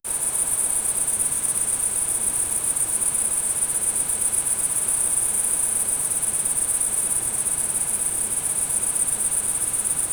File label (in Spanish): ambiente sonoro misterios, mountain